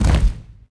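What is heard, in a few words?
stone hit stone medium; objects; Star Wars Obi-Wan; XBOX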